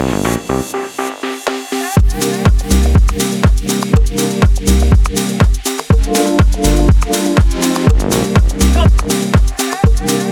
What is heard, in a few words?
chill lounge vibes loop